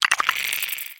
curious, clams; infestation

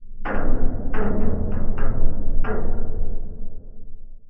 bridge stress; ambient; Counter Strike Model Pack; CS:GO; survival

